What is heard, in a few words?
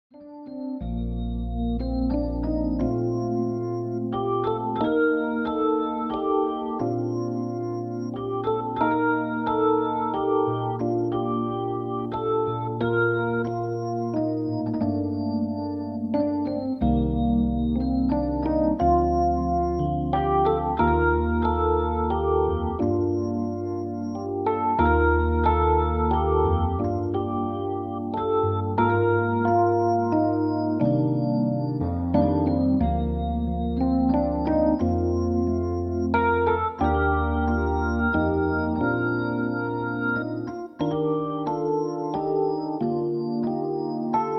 christmas jazz classics; Weihnachtspraesente